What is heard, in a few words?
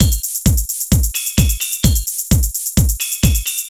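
drum loops, right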